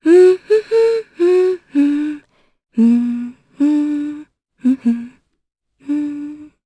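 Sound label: Vox Hum, Japanese; Maria; kingsraid; voices heroes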